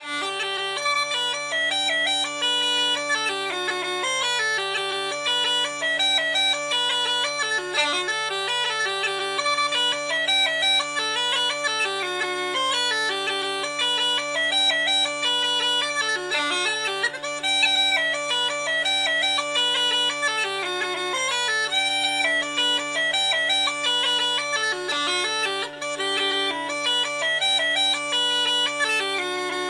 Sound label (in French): Jig En Sol